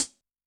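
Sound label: Close, HiHat; Yamaha DTXpress-II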